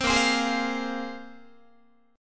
Bb 7th Suspended 2nd Bb, Guitar 4A 4E 5A 5D 5G sharp 6C sharp; Bb7sus2; strummed; 15,18,15,18,16,16; JGuitar